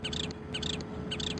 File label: Pratincole